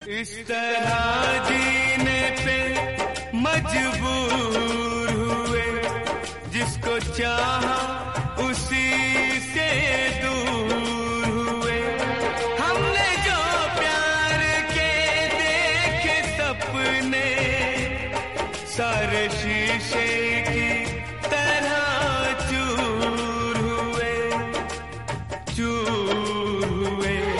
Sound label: sad hindi